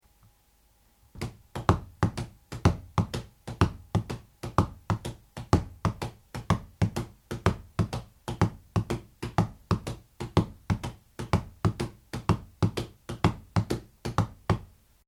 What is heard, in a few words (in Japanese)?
第二パターン（太鼓合奏曲ングワイ）; africa